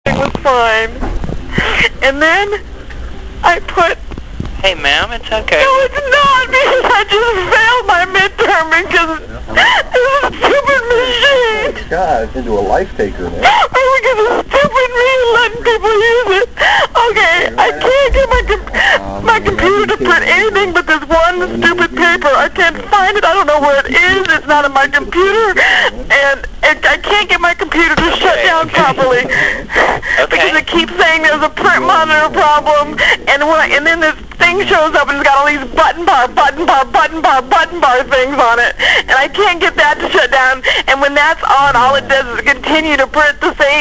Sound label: Crying Midterm Failure